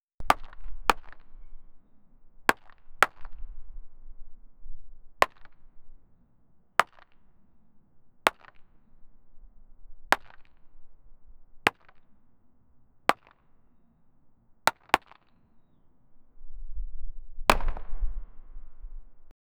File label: tennis balls falling on a